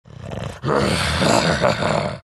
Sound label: growl; bloodseeker